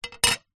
glass sheet small square set down on wood; foley; zapsplat

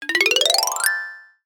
cartoon ascend climb mallet; zapsplat

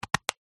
office pencil sharpener chamber plastic lid close

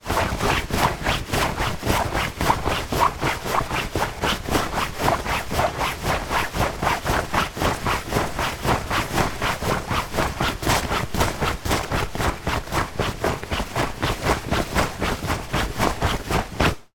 foley denim jeans running movements; zapsplat

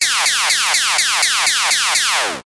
game retro laser repeat level complete

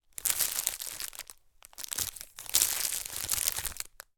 foley item remove from foil packaging

